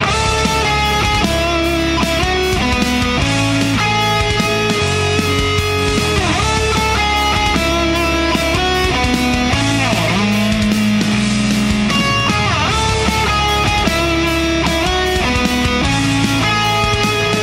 rock version; rnb

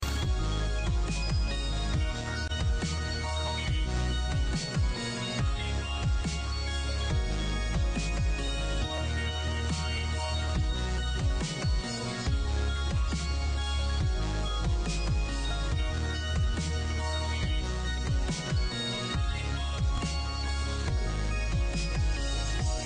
Soft Chime

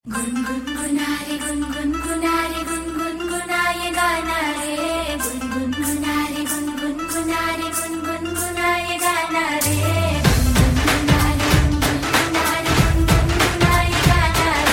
distortion; alternative

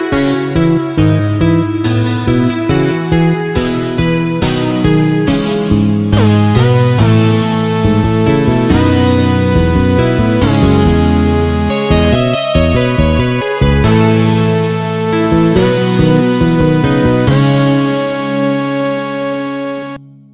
Techy bass

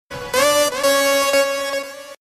beat, hip hop

